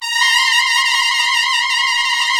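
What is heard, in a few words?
brass trumpets, FX; Roland L-CDX-03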